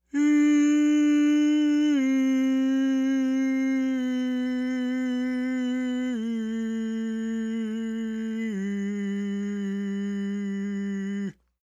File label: Overly Dark i